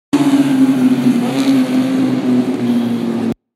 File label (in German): motorraeder